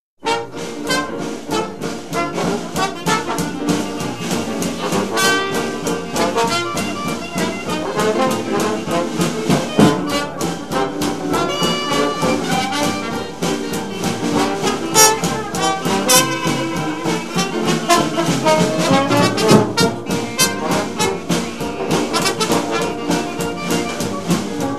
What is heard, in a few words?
Jazz Band